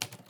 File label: click